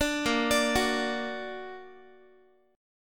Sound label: Bb Augmented Bb, Guitar; arpeggiated